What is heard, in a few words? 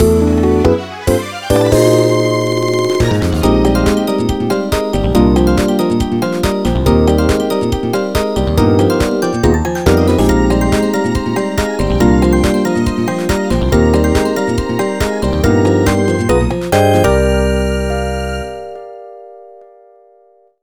Level End; Midnight Play Pack